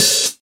studio open hi hat d key, open hi hat sound tuned to the D note